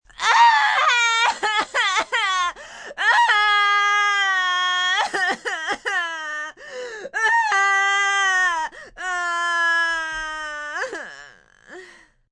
Cry Screaming, Cry, female, screaming despair, pain, hurt, sad (human voice); Crying Sound Effects